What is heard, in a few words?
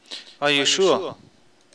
cancel